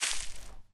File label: grass, Footsteps; zps; Foley